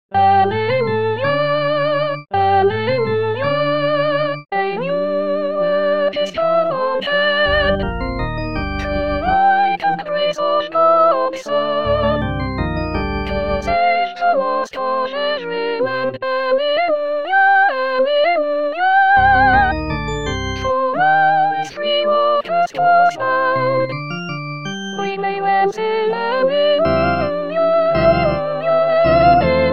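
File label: Soprano, rehearsal tracks christmas; Alleluya A New Work Mathias; Xmas 2019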